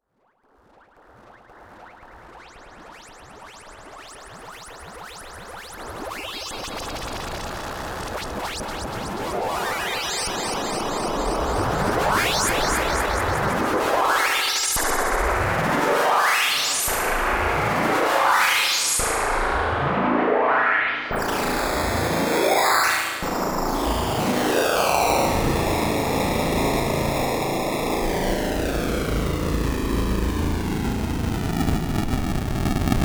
Noise Jam Through Master Effects Chain

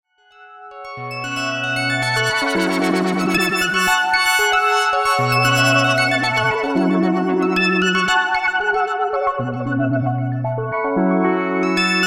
Pad with Reverb and Delay; GMS

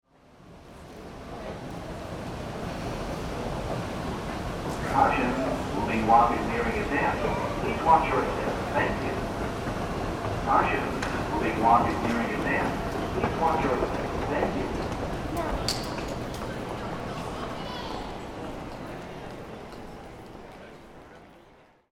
moving walkway

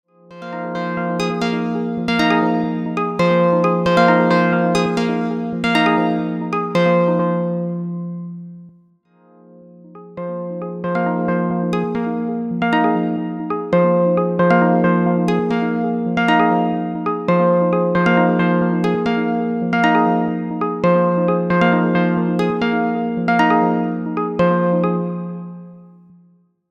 Out of Tune In Tune

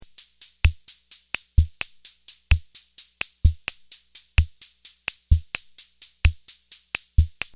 Mystery Analog Drums

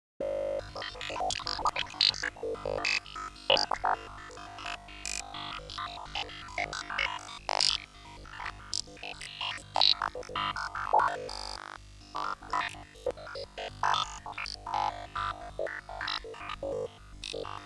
Water Dripping Sample in GrainMachine

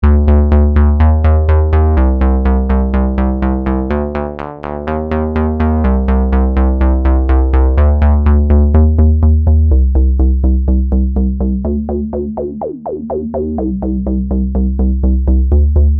Moog Minitaur Bass

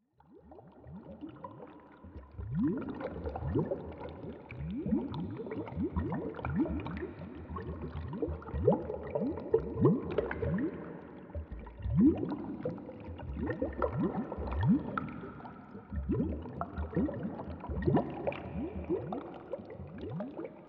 Water Atmosphere

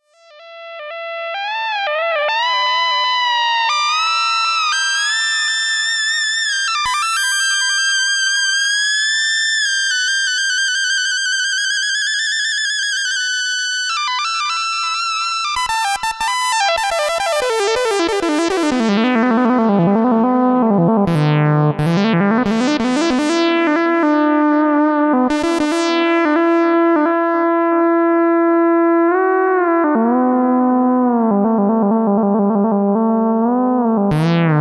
Synth Solo

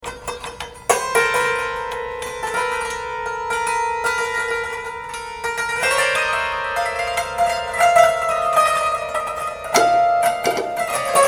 metal piano mallet

